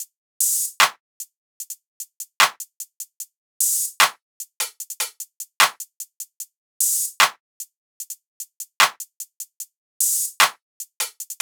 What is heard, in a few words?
Top Drum Loop - 150 BPM, Hip Hop; Drums; Humble Hip Hop Sample Pack